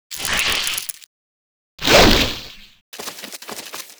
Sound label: Spider Action; Seer; GamigoNA